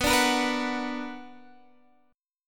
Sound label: B Minor Major 7th, Guitar, Standard; strummed; JGuitar